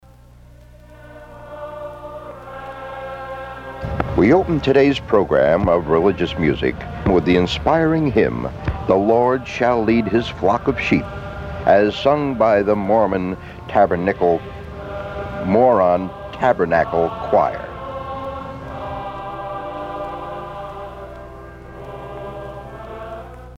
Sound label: Mormon Choir; AllTimeGreatBloopers